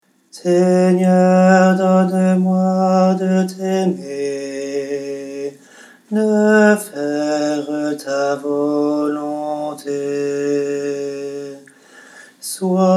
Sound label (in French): seigneur mon bouclier alto basse; choeur d'hommes